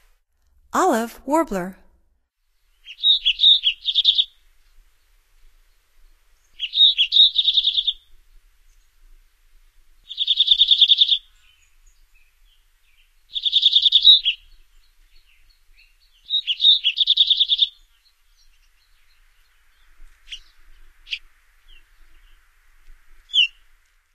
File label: Olive Warbler, Songs of Birds of North America; Stokes